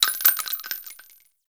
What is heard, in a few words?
Pistol Shell Droppings; weapons; Warframe; Grineer; Orokin